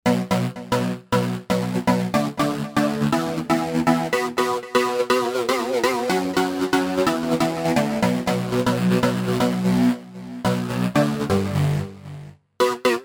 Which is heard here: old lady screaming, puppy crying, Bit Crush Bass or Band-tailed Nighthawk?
Bit Crush Bass